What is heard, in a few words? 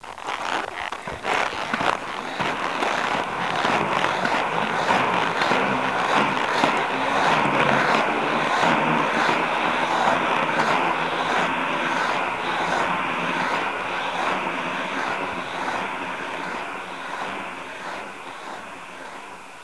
skate